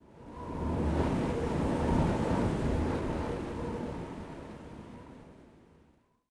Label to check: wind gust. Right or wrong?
Right